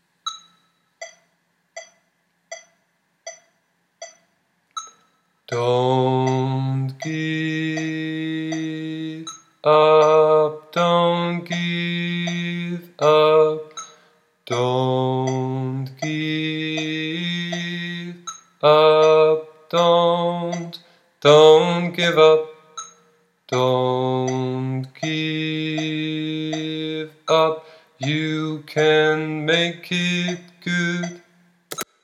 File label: Chorus Tenor